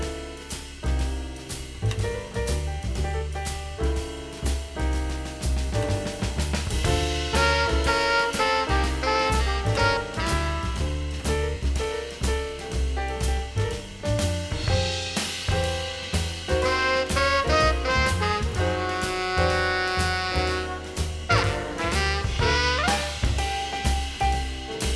jazz

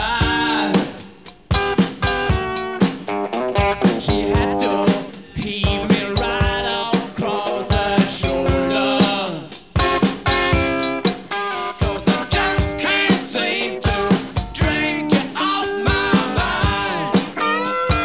rock

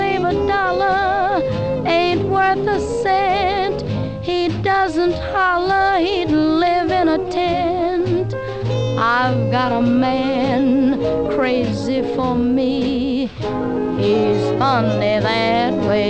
jazz

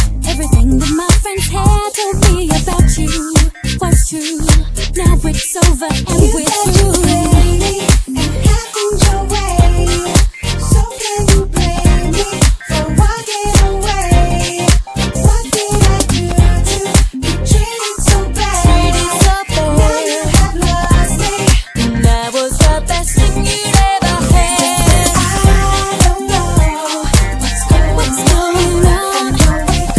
pop

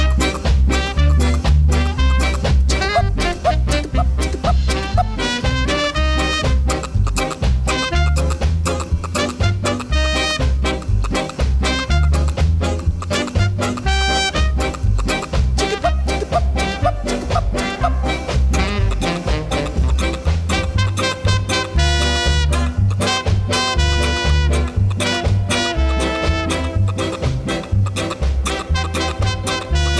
reggae

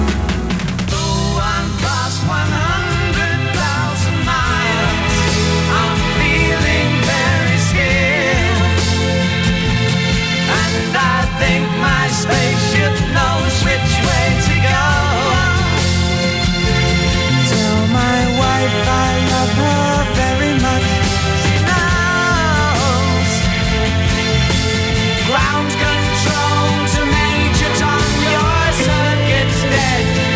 rock

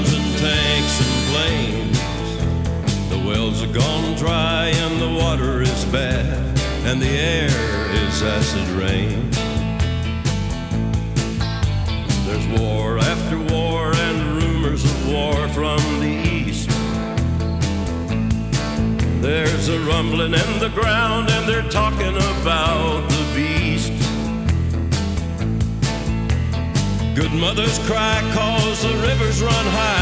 country

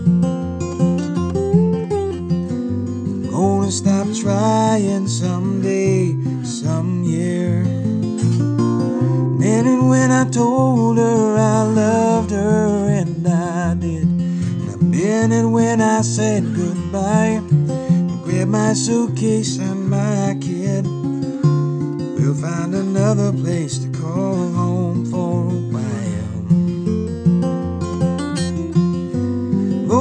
blues

